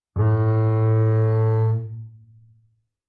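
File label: Bass arco fortissimo sul E C3, Double Bass; MIS Pitches - 2014; Strings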